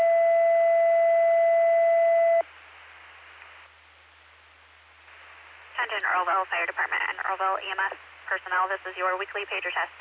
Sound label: Fire; Earlville; TTDAlerts